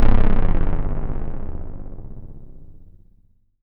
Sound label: C1, synth; 90 s Sample CDs; NiceBeats; SynthLine VOL-23